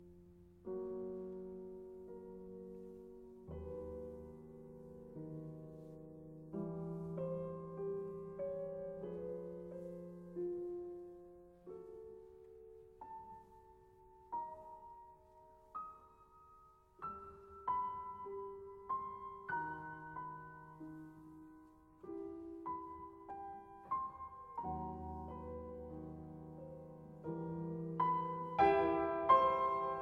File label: Sonata For 2 Pianos In F Minor Finale Poco Sostenuto; Brahms